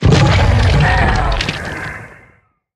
worm death big

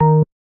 bass, programmable speaker

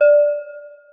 celesta, programmable speaker

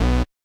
saw, programmable speaker

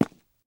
rails, walking; Factorio

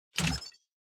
blueprint create; Factorio

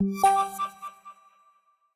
new objective; Factorio

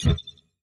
paste action; Factorio

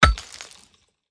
axe mining stone; Factorio

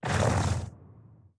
biter call behemoth, creatures

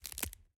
plant, walking; Factorio

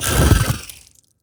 biter death, creatures

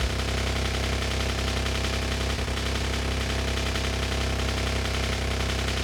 car engine; Factorio

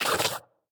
biter roar mid, creatures

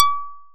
plucked, programmable speaker

